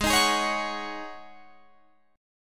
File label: G sharp 7th Suspended 4th G sharp, Guitar Drop D